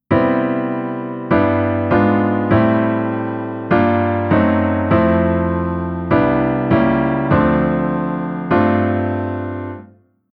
Chord Reharmo

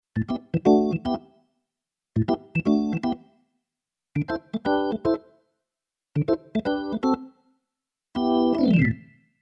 Keyboard Organ Funk Organ

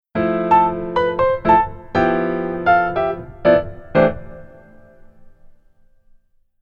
keyboard voicing form

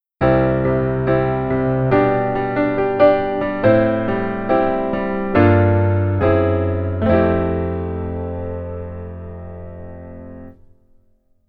Keyboard Velo

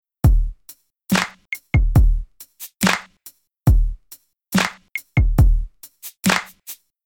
Rhythm RnB

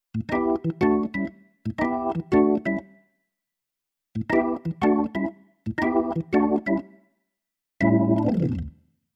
keyboard organ blues organ

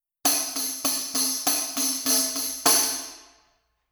tabourine

